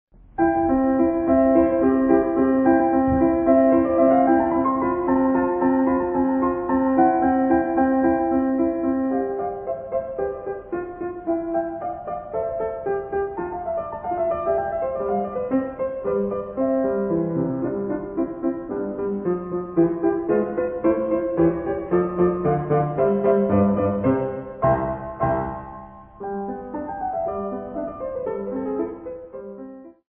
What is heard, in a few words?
old piano